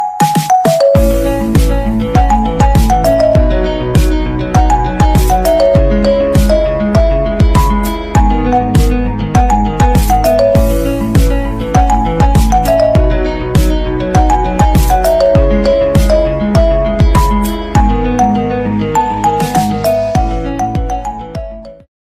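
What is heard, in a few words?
romantic music ringtone, bollywood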